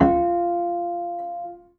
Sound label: Harm Mid, Piano Harmonics